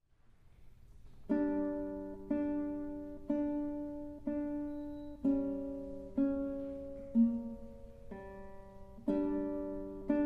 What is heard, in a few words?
Solo Guitar; Three Short Pieces